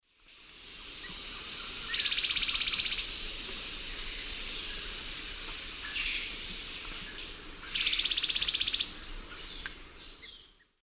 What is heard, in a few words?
Myrmoborus myotherinus, alarm call; S. America; Eastern Lowlands